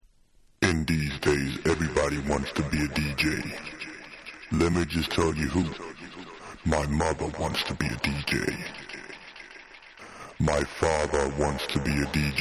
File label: I JUST WANT TO BE A DRUMMER, Acapella